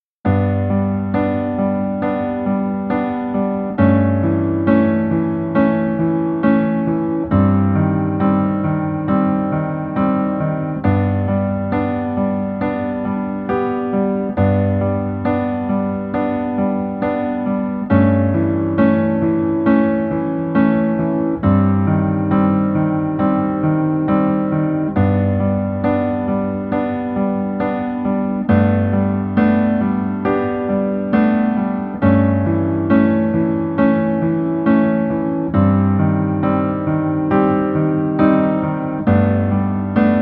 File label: Piano